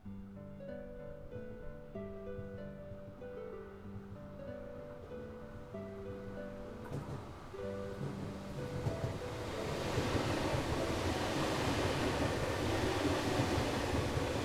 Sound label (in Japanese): 電車低速通過 入れ違い